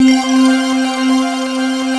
ATMO SYNTH

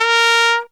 TRUMPET FX; Zero-G; Phantom Horns

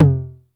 909 HI TOM, TR-909; Crypton - DrumBase5000

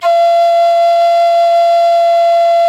E 5, reed flute; SoundBytes - Early Patches

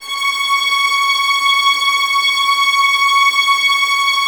VS C#6 Tremolo, 11 Violins; Miroslav Vitous

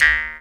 jaw, Didgeridoo and Other Primitive Instruments

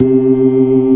ORGAN, C3 NO PERC; Keith Emerson Signature Series

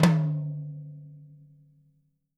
SD TOMS, Living Drums; Sampleheads; Peter Erskine

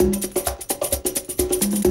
Perc, Percussion; Sound & Vision - Gigapack I

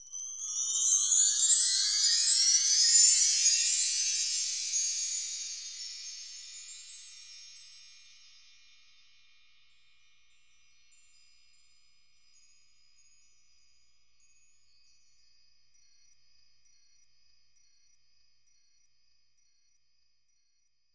Btree L, BELLS; Roland MV8800

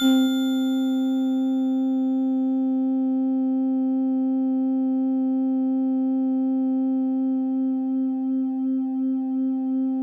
C4, Synth Line

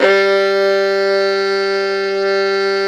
violin G1, Balkanish Traditions

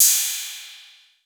808, CYMBAL; Wizoo - Platinum 24 Electronic

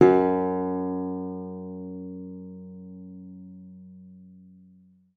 F#2, GUITAR; Xsample VOL-2

